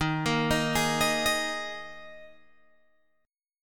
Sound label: D sharp Suspended 4th, Guitar, Open G